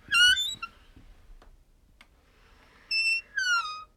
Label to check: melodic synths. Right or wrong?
Wrong